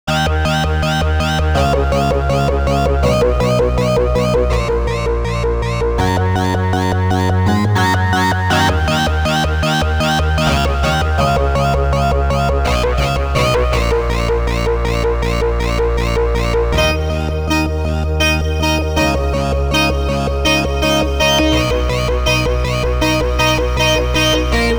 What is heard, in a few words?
energy shield seq; the digital life